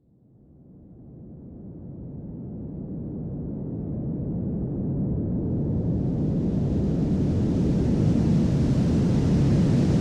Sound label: Tsunami hitting a Large City; Sample Audio Pack; Free Pack